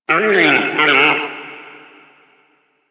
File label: forest creature low mid far; Ambience; Warframe; Grineer Forest